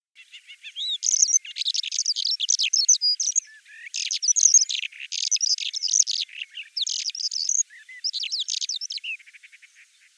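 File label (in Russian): chernyy zhavoronok golos, black lark voice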